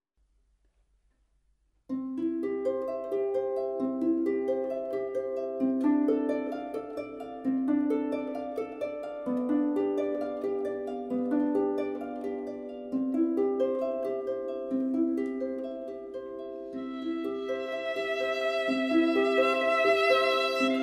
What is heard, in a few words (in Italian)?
arpa violino; Ave Maria; Gounod; matrimoni